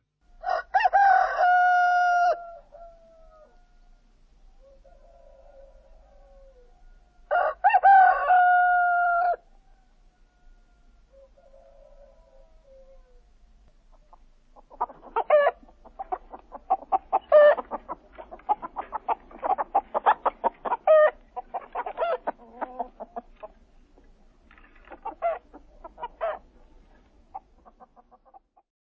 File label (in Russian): petuh i kuritsy